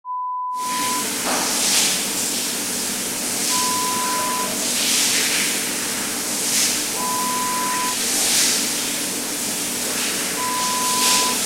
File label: gym changing room sound